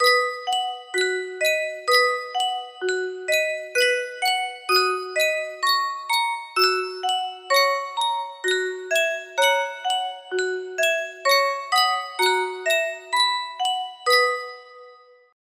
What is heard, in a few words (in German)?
sankyo spieluhr